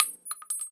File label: shell 39mm default; STALKER; IWP